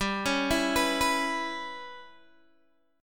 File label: G 6th Flat 5th, Guitar, Open G